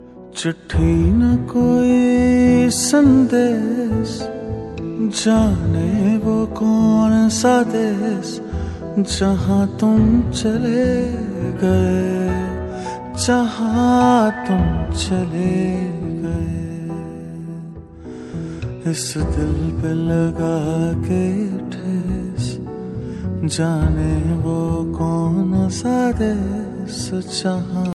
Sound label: romantic ringtone, bollywood ringtones